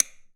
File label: CUT OUT, SWITCHES; AKAI Anime Sound FX